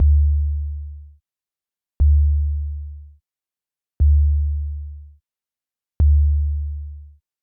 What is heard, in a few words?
sub kick dco no attack; waves element